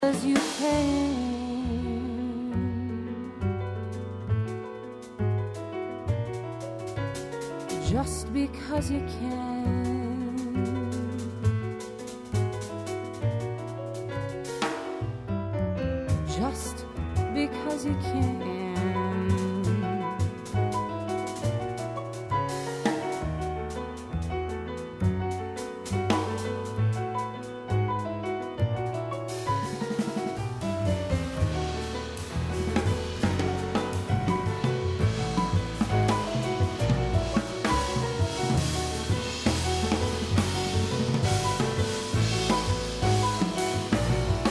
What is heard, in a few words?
jazz